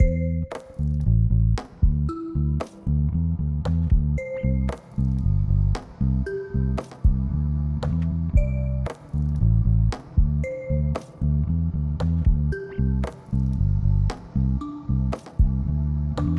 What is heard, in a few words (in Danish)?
brandmusik fuld; Alexander Vienberg; audio branding for laenken